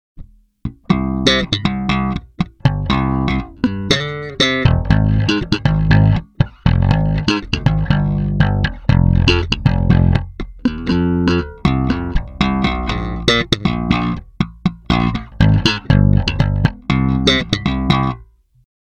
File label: SLAP ACTIVE, TAURAMアクティブ・スラップ（EQセンター）, ベース; 時は交換前後で録音レベルは揃えたが、DAWで書き出しの時はリミッターで音量を揃えた。